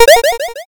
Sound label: picoyes